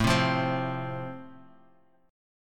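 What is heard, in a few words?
A Minor Sharp 5th, chord strum; Am#5 strummed; 17,15,15,17; JGuitar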